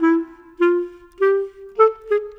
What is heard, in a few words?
Clarinet; Sonic Foundry (Sony Creative Software); Orchestral VOL-2